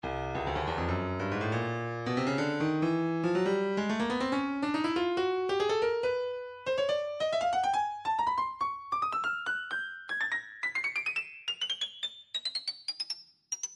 breakbeat midi 070 bpm urban tight; Stylus Breakbeat Midi Files

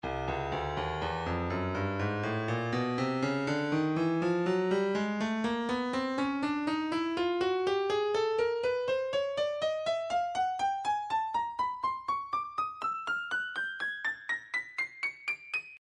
breakbeat 061 bpm; Stylus Breakbeat Midi Files 060 064 Bpm; Lumpy C

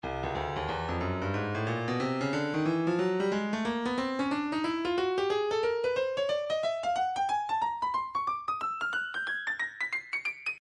breakbeat midi files 090 091 bpm; Urbadub E; Stylus Breakbeat Midi Files

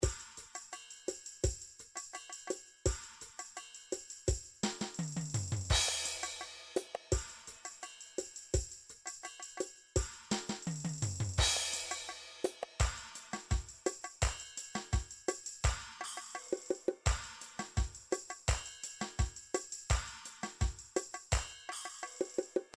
drum midi files ballos

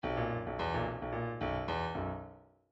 midi grooves rock

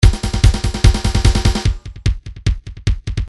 drum grooves loops techno; Steinberg Nice Beats 1000

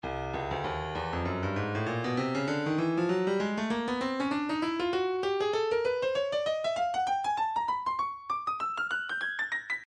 stylus breakbeat midi 098 bpm; Lift

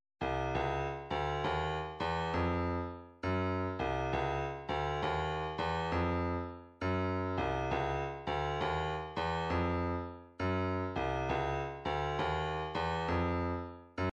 134 snare, midi; Rabid

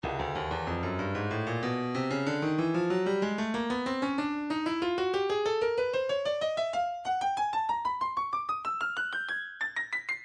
breakbeat midi, 94 bpm; innerbeat b; Stylus Breakbeat Midi Files

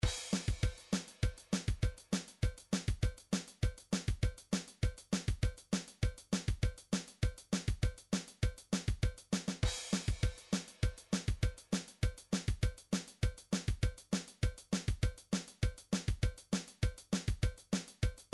drums country, midi drums

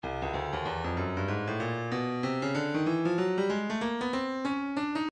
stylus breakbeat midi 095 bpm; Teddy

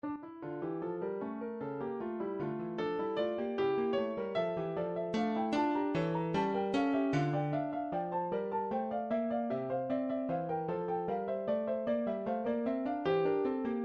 piano, midi

